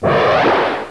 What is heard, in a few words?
WHOOSH